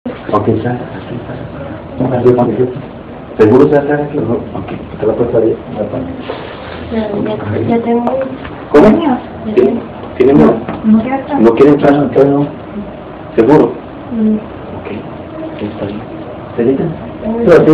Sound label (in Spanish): respiracion; Grupo de Investigación de Actividad Paranormal